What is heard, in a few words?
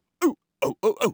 Man Dance Happy; Zomboid; Lifestyle